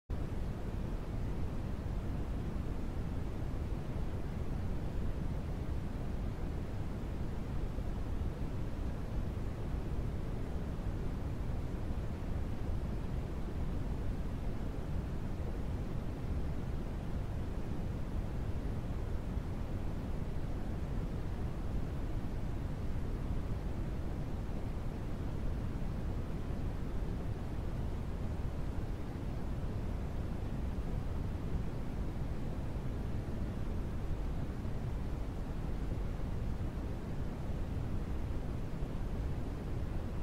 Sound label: white noise